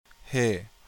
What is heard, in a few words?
heh